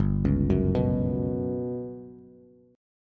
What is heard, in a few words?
G Major 7th Flat 5th, Bass; arpeggiated; JGuitar